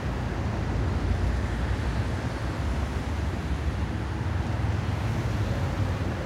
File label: office outside traffic lp, ambient atmosphere